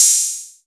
Open Hat 808; EMU Xtreme-Lead-1

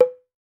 CLAY BONG, African Perc; E-MU Formula 4000 Series Vol. 4; Earth Tones

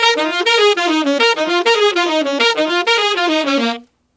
Sax Section in Bb min, Jazz; Sonic Foundry (Sony Creative Software); Jazz Solos and Sections

